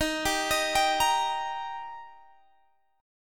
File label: D sharp Major D sharp Guitar Standard, chord; arpeggiated; x,18,17,20,20,18; JGuitar